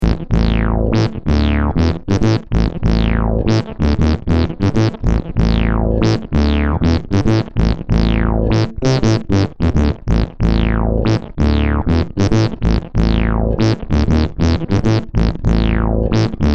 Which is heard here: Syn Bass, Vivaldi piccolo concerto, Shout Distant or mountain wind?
Syn Bass